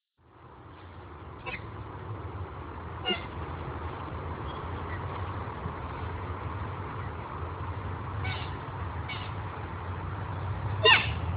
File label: coots at cabbage tree creek